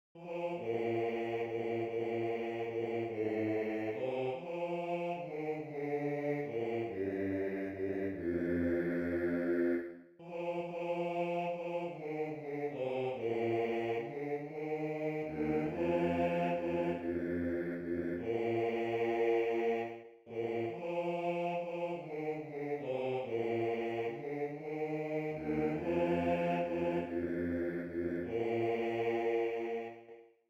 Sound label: Bass II; Untreue